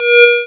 ぽーん

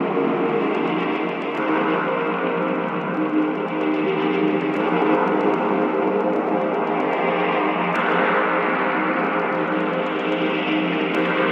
夜のイカ (ambient); ゆめ2っき

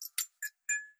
蛍光灯

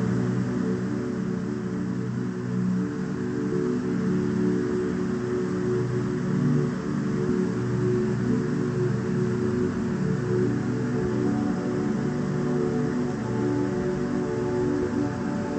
雨のパッド; ゆめ2っき

